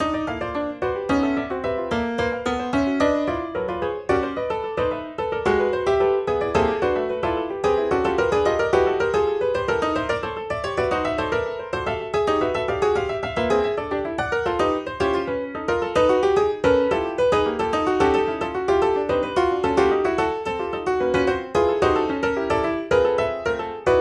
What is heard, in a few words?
piano Nice Grand 110bpm